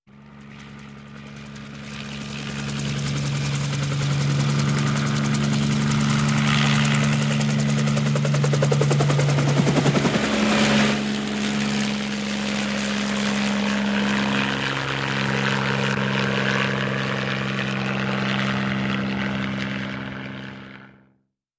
Helicopter Propeller Noise Near

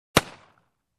Pistol shot from distance

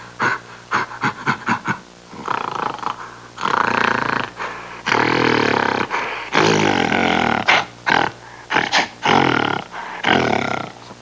Jungle gorilla sound effects

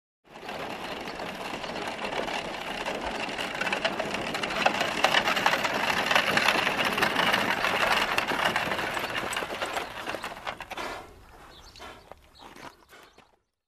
Horse Carriage incoming

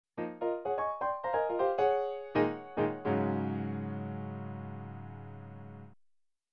Jazzy Fast Piano Transition

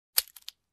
Cracking wooden stick

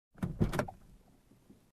Car door open from interior

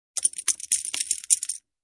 Poker Chips Bet

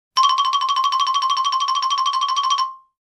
Funny xylophone running sound effect high

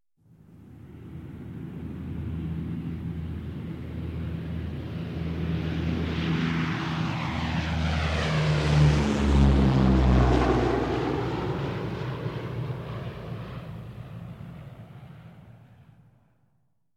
Big Propeller aircraft flying over to land

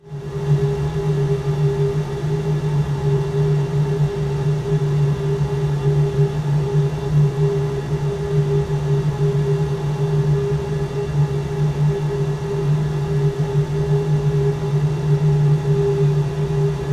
House heater ambience

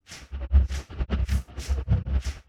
Distance whoosh sound

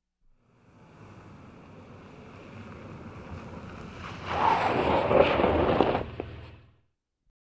Car Nearing and Brakes on Rocky Road

